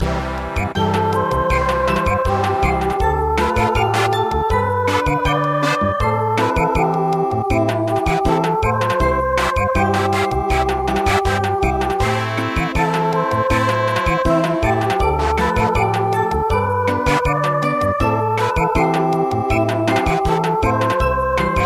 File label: Samba; Cha puk